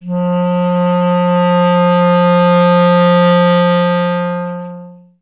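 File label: wind clarinet F; Miroslav Vitous; Solo Instruments VOL-1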